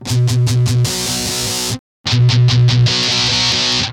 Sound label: marshall dist; spksim